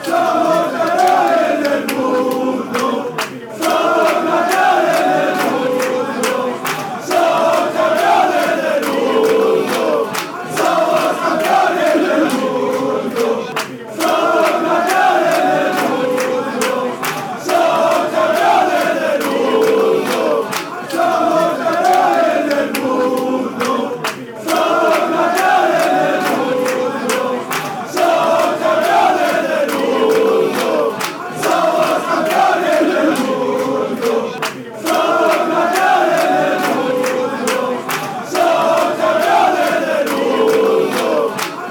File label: world champions spain fanchants